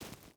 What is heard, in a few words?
footstep barefoot gravel walk; Rust